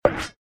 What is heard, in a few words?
cancel Menu